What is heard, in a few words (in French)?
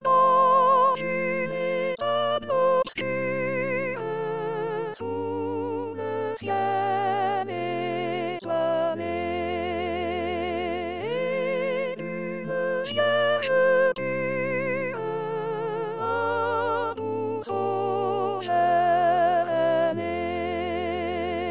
Dans une etable obscure Soprano